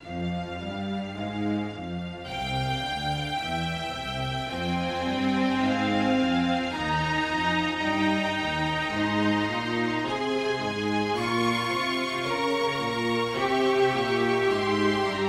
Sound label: Strings Legato Bowed Strings; Synthphonica; Syntheway